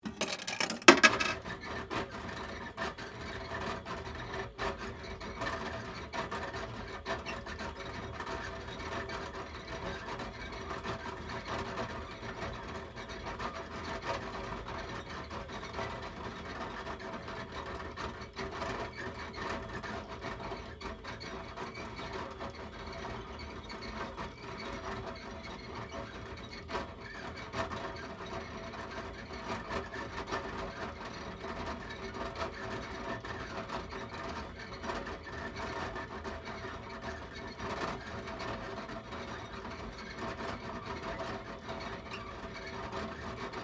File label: Arcade Game Lever, Technology, Arcade, Game, Mechanical, Gears, Bone, Shakers, Vintage; BlastWave